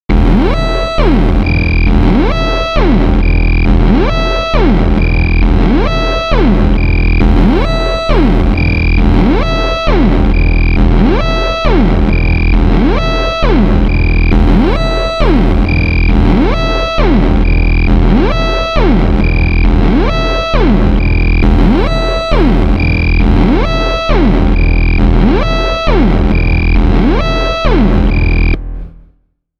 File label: siren